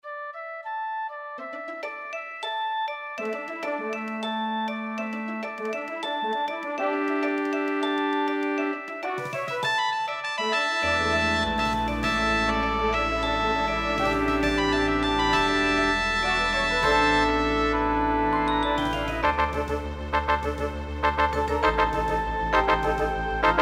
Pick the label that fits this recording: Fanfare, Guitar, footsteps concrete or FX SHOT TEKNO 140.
Fanfare